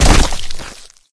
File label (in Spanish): caida